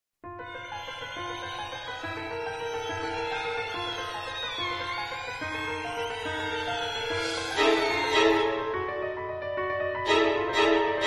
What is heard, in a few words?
Spooky